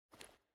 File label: Foley Gear